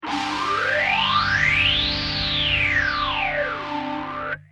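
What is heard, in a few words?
guitar stage phaser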